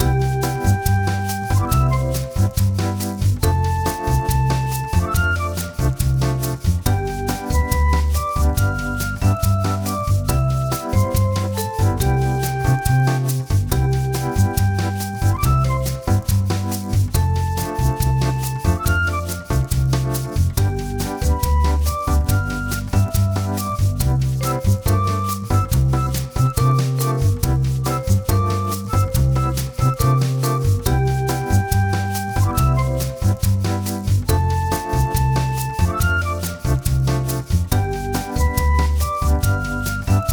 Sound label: Lobby Theme; Jackbox Games; The Jackbox Party Pack 1; LIE SWATTER